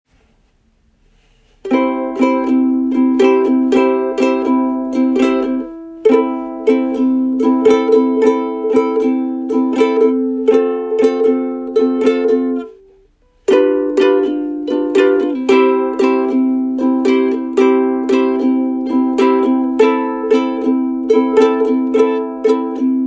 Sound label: Ukulele middle finger up strum